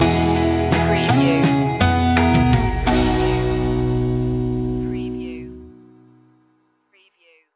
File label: take it on home stinger